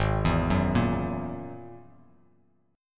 G sharp 7th Suspended 2nd Sharp 5th G sharp, down, Bass Standard; arpeggiated; chord